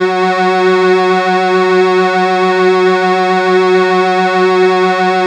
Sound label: SIEL, String Machines